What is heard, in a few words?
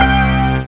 SYN DANCE; Sound & Vision - Gigapack II